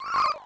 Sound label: Schrugging; Pushover